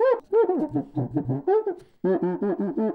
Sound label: cuica 162BPM